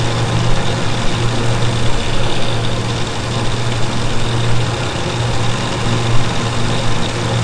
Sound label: slurry; ambience; caged